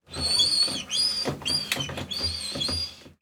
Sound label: Transportation Boat Engine Handles Move